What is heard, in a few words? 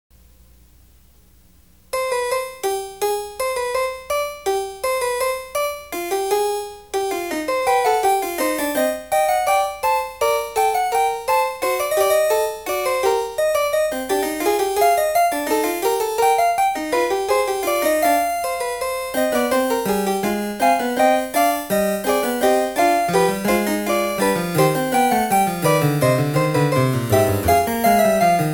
bach wtc Cm fugue